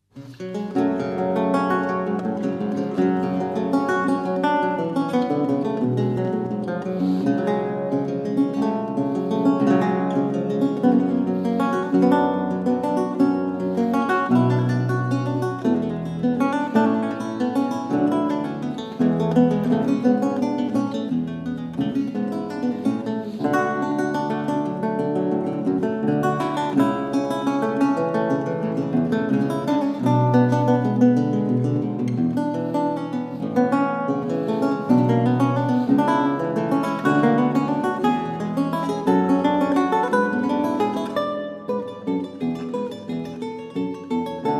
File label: Sonata in g minor courante; weiss